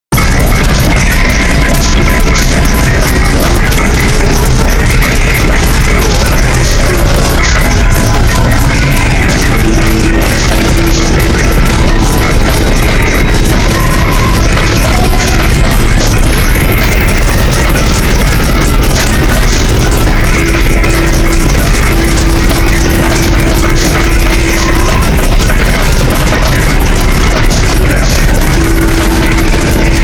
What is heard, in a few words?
noise wall; weeklybeats-2016